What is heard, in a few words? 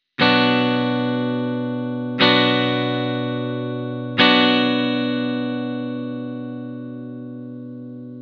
chord b minor, guitar